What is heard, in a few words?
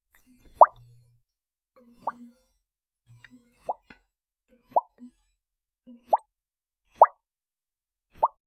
cartoon characters eyes popping out in surprise